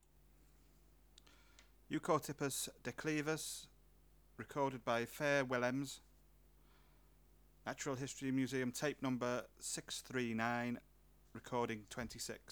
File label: Euchorthippus declivus